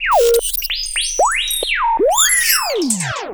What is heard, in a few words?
SPACEY REWIN; ENVIRN-AMB